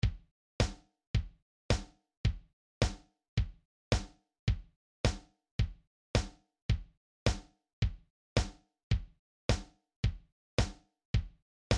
Clap In Time DRUM

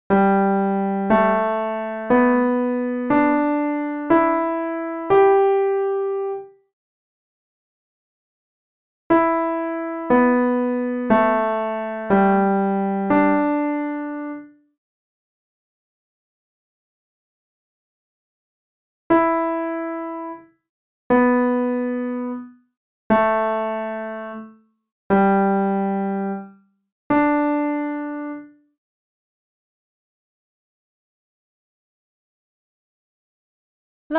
Hard Solfa Melody

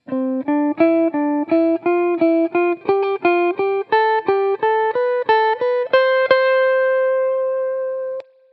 scale example; C Major in triplet runs

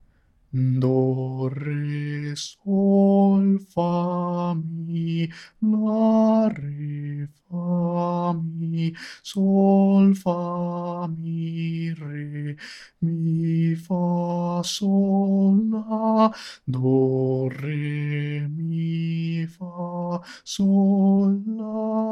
Sung

